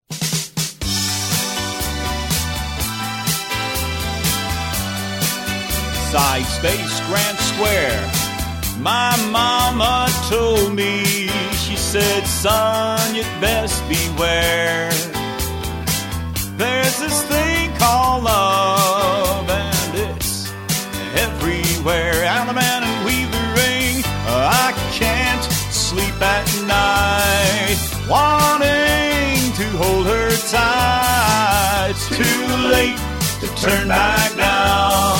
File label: vocal